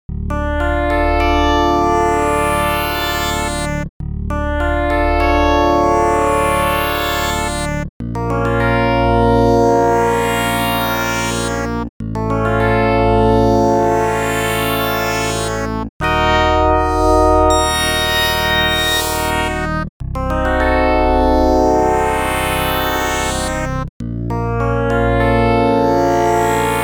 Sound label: wavetable osc